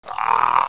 ab pain; svencoop; portal house; aberration

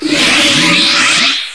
teleport out; firearms

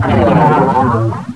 shock trooper die; cryocell

